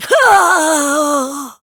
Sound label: Death, Barks; Kuva Lich; Persona B